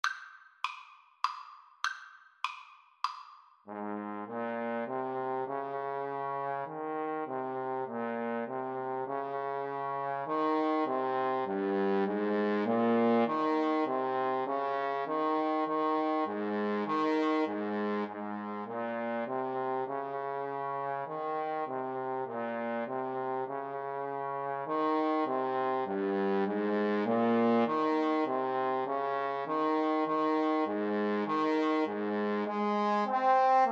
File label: Trombone Duet